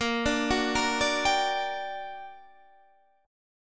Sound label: G Minor Major 7th, A sharp, guitar chord